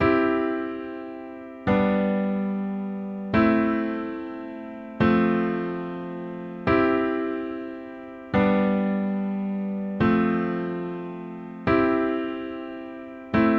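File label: root position; accompaniment both hands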